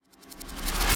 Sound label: Footstep Metal-Fence; Viewfinder; Rev